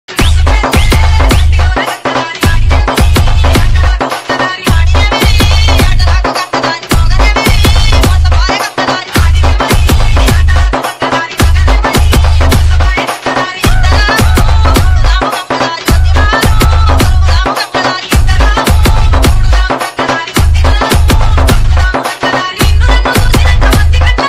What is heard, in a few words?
Telugu ringtone